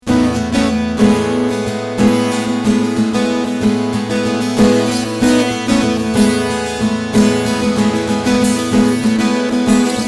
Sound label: Strumming EQ Full